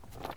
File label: pebble pickup; Apotheorasis